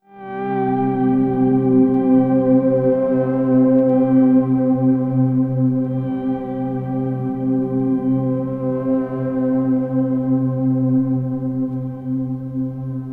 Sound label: Drone